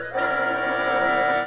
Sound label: Orchestra Suspense